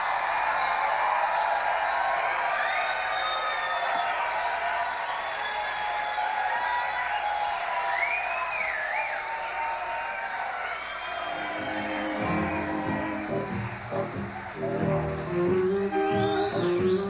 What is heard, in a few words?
Crowd; Portland, OR; Roseland Theatre